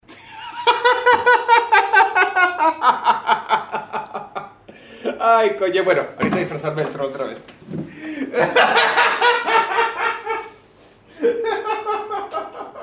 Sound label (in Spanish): risa; dross